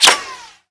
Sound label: impact helmet